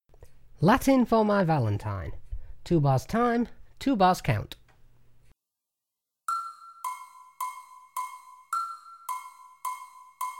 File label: Latin for my Valentine Backing, drum kit